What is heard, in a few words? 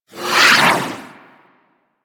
Sword Projectile By; Doom Sword; Warframe; Excalibur